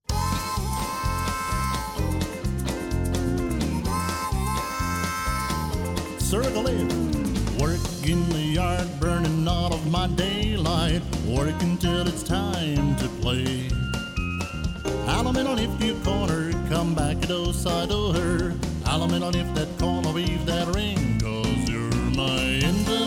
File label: vocal, square dance music